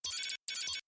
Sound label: screens on